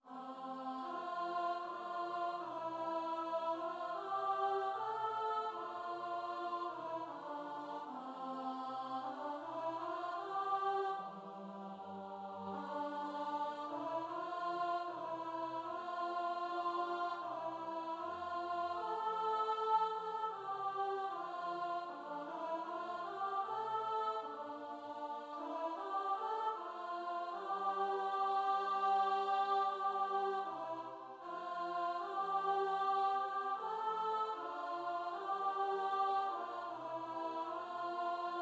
the silver swan alto

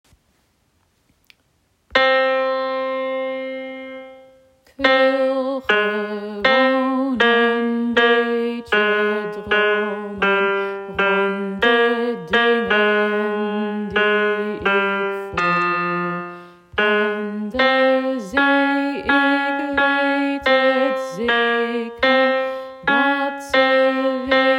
dutch song bass part second verse

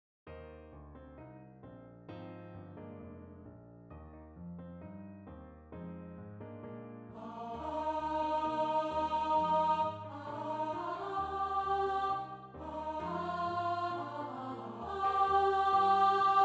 satb piano

